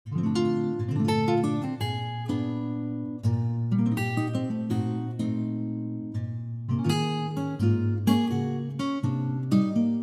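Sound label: Bb acoustic guitar; key - Bb; vocal range - Bb to C; Wonderful acoustic guitar intimacy; light swinging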